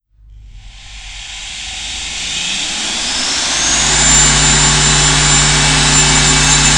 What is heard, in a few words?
machine, ambient; quake; deathmatch